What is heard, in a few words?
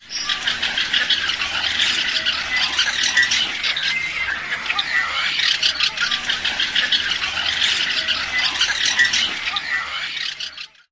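flock cacaphony females, Tricolored Blackbird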